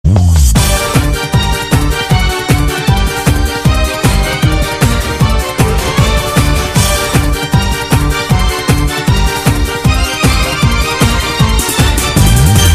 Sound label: Hurry Violin